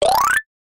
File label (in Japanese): 場面切り替え 木琴 上り 速め; 場面切り替えや心理描写; 演出効果音